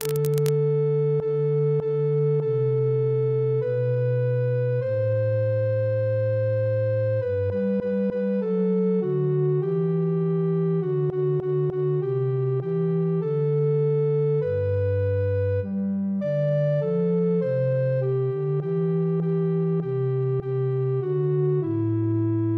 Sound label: io soffriro No alto